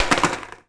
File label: skater board down; skate shop; Tony Hawk's Project 8; XBOX